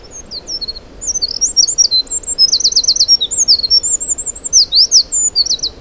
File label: Winter Wren Type 2A